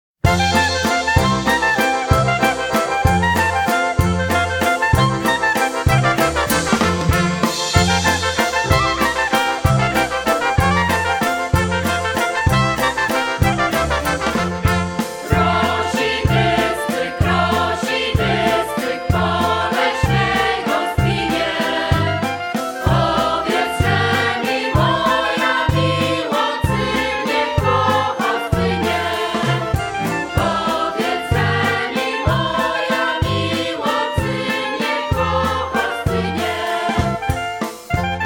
Oberek

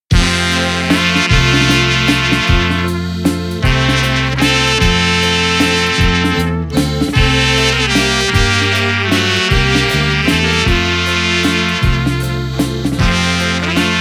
Waltz

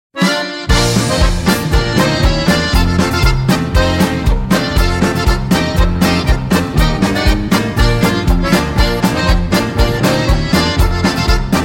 Polka

